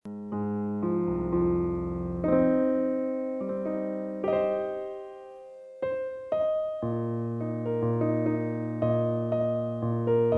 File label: Piano